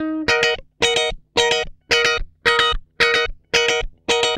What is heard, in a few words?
GUI, 110 BPM